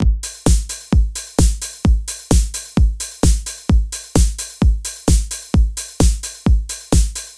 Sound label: Kick Simple Beat Clean; KSHMR Essentials